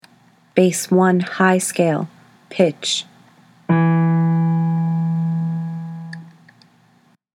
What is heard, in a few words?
bass scales high; all-state choir